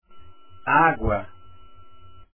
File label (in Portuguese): agua